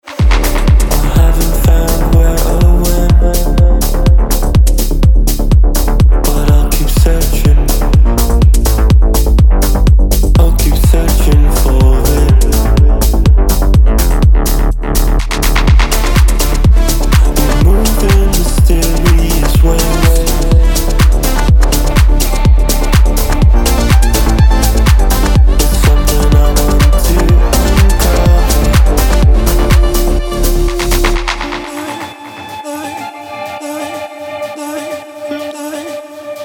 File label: ELECTRONIC